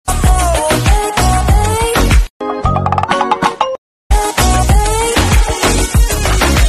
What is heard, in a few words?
funny bgm